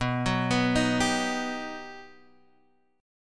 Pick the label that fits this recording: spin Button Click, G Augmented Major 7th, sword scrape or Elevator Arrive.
G Augmented Major 7th